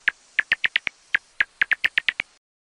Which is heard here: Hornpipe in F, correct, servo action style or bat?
bat